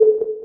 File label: WRONG